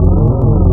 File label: Super Phaser, wavetables; Animoog